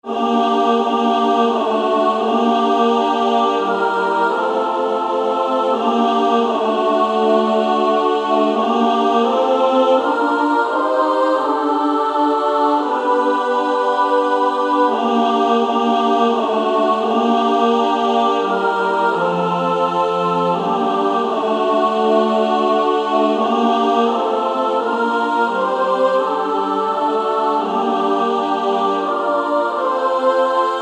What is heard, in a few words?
Female Choir; Coventry Carol; SSAA